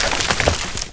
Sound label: treant move; footsteps; furion